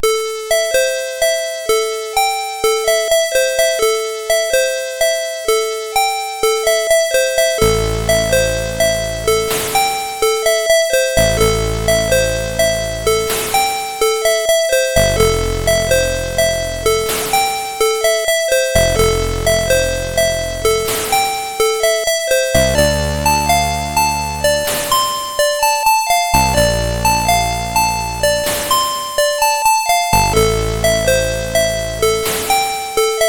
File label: Dreamy Bells, sid CSG8580R5